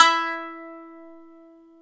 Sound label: BAGLAMAS E 3